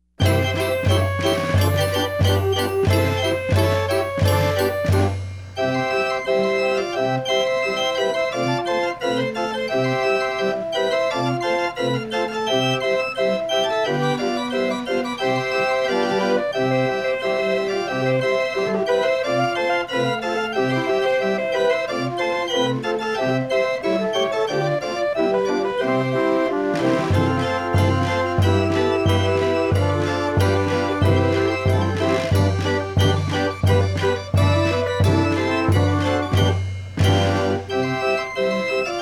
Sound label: Wurlitzer Christmas Carousel Music